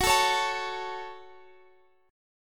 F sharp Minor F sharp, Guitar Drop D

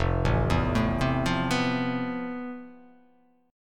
F Major Sharp 11th, chord; arpeggiated; FM#11; 1,0,2,2,0,0,1,x; JGuitar

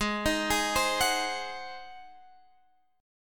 G sharp 7th G sharp, Guitar Half Step Down

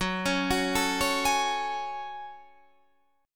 F sharp Minor Add 9th F sharp; arpeggiated

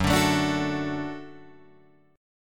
F sharp Minor Sharp 5th F sharp Guitar Open G

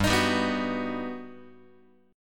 G Augmented Major 7th, Guitar; G+M7 strummed; JGuitar

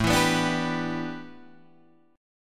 A 7th Suspended 2nd Suspended 4th Guitar DADGAD

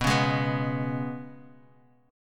G sharp Minor Double Flat 5th B

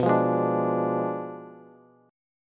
B Diminished 7th B Bass; Bdim7 strummed; JGuitar

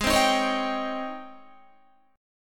G sharp Major 11th G sharp, down, Guitar; JGuitar

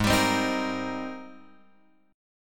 G sharp Major 9th G sharp; G#M9 Chord {4 X 5 5 4 6} strummed

